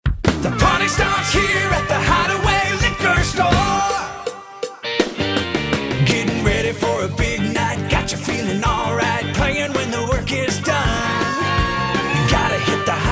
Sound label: Liquor Full Sing, drink jingles; Hideaway